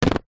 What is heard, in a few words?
skateboard plastic set down on street; sport